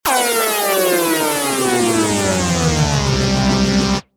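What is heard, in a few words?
gauge down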